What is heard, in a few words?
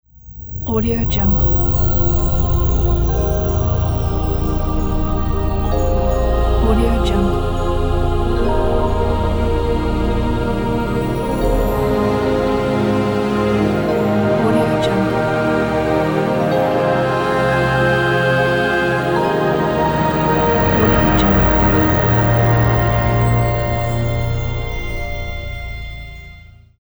angel wings ident